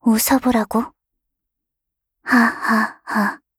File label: laugh; Eternal Return; Katja; KOR